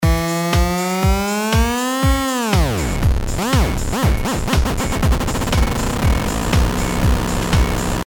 synth recipe

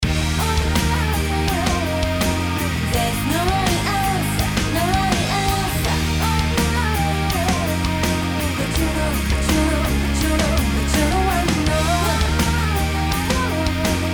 tone off

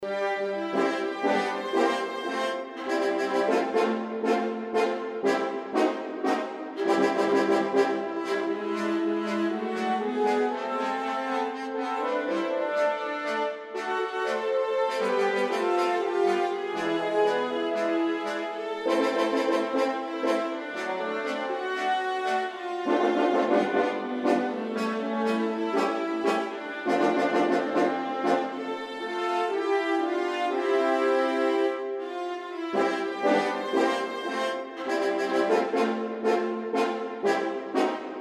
Backing

